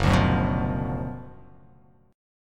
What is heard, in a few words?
Gb Major 7th Suspended 4th Sharp 5th; GbM7sus4#5 strummed; JGuitar